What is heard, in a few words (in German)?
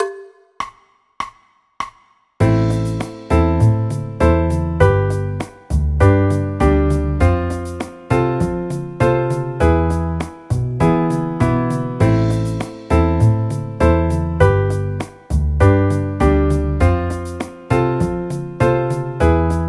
a moll akkordverbindungen